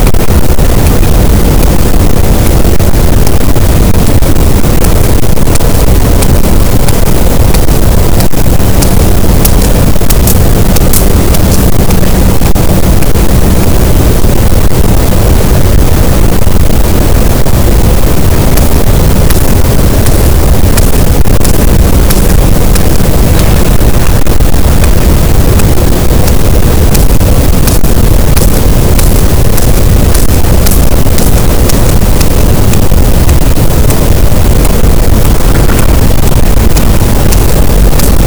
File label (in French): cachalot, Physeter Macrocephalus, gaussian noise